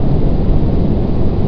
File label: amb industlp